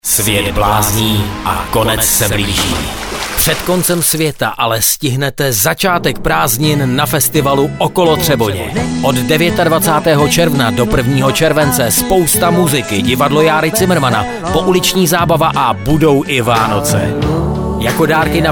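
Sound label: jingle; Okolo Trebone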